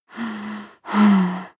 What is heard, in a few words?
breath female moaning breath